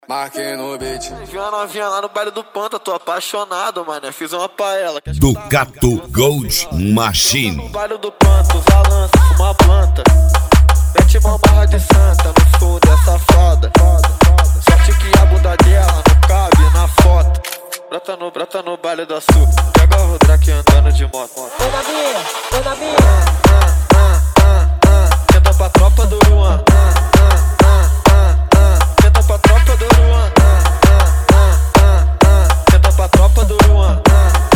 especial funk

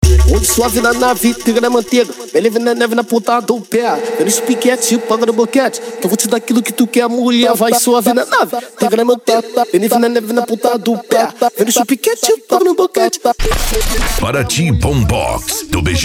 bombox funk

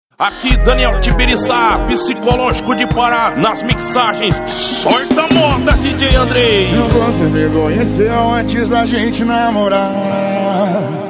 sertanejo com locutores de rodeio

